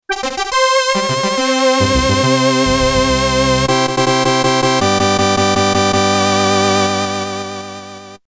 Harvest Ceremony Fanfare; Princess Maker 2 Refine Original Soundtrack Complete Edition

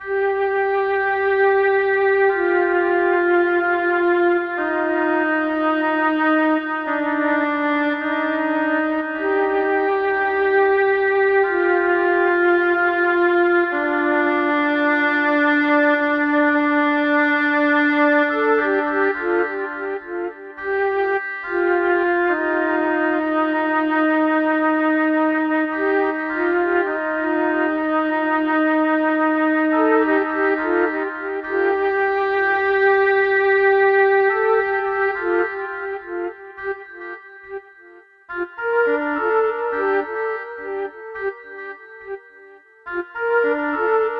Flute

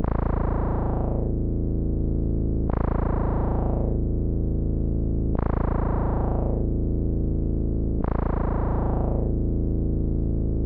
Drone

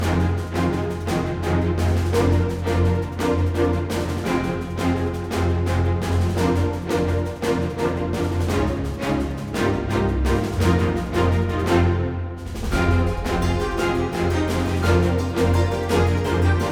Battle Theme Loop

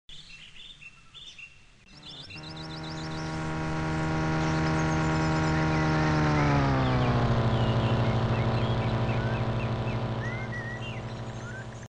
The Sound Of Passing Car; Dude Theft War; Android

